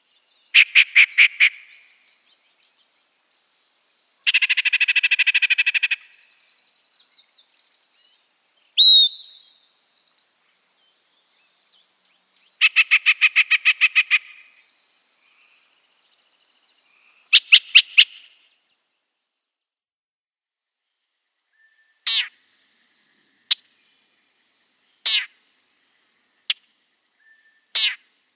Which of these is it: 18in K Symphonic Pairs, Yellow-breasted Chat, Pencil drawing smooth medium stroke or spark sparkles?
Yellow-breasted Chat